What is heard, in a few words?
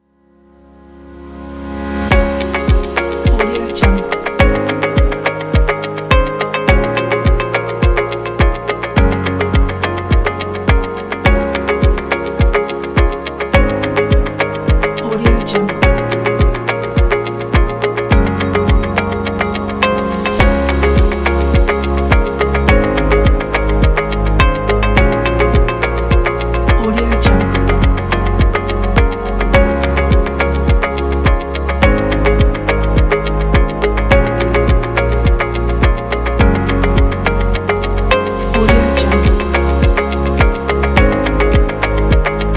corporate upbeat background kit; graywhale